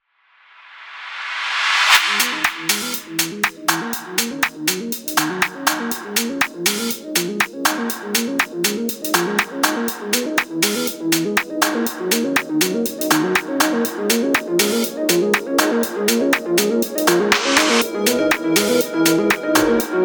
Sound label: Fashion House Intro